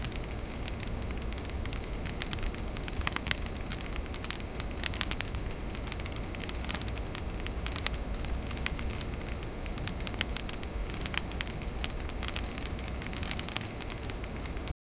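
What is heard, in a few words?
soft crackling of a nearby; fabric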